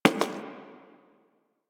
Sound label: Human Loid Foley Step; Warframe